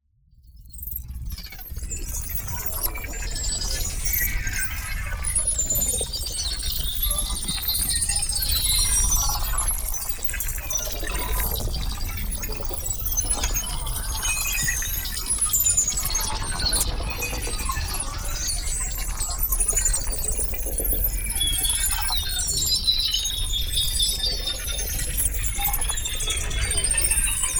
NEON MOVEMENT; Energy Vortex; boom library

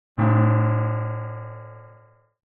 Metal Pan Impact Hit - Large Baking Tin; Hollywood Edge; sound designer's toolkit v2

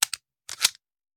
Hand Gun Foley; Sound Ideas; Guns

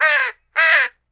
crow call; DeadFright

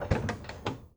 Ferrari Testarosa Door Open; Automobiles

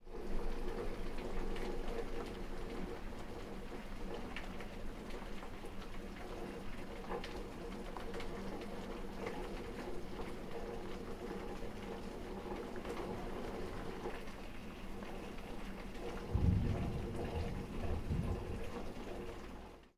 thunderstorm, rain hitting windows and doors; ambience; NS Studios - ultra sound collection

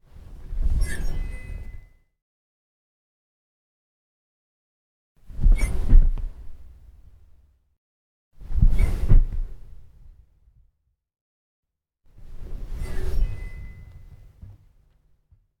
sit down on sofa; human; NS Studios - ultra sound collection

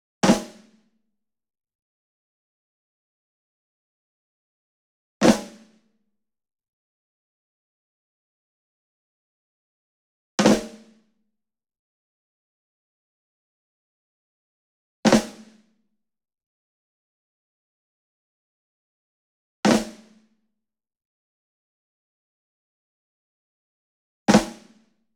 ENSEMBLE SNARE Group Flam Near; boom library; Cinematic Strikes Construction Kit

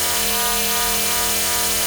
Sci-fi Hover Bot Loop; SFX Kit; Sci-Fi Loops

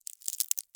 CRACK Bright Crisp; mono; Universal Sound FX; BREAKS & SNAPS

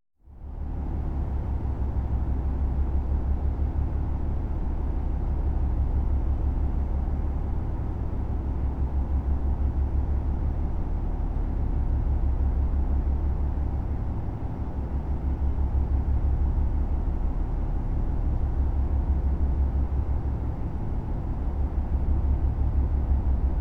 Boat Engine Running; KMP Film Video Sound Effects

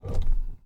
steer side; Blind Drive

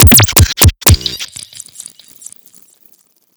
Needle Shots; Plastic Surgery

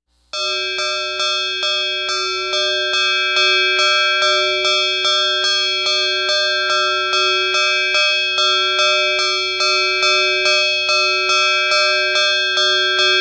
Walking Machinery Trucks

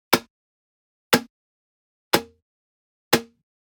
Generic Click Wood Medium; boom library; The Interface

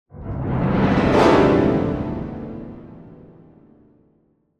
Piano Fast Ascending Tension - Hard Gliss; Hollywood Edge; sound designer's toolkit v2

